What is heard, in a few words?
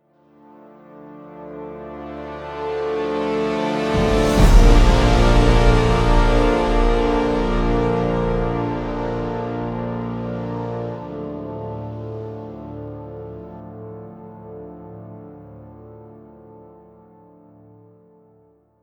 Music Stinger; frontlines fuel of war gamerip 2008